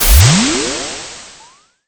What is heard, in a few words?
splash